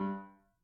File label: Grand Piano Main Release G1, Kawai; Chordophones; Releases; Legacy